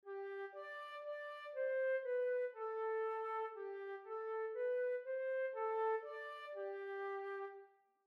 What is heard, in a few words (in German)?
Tonfolge ohne Taktart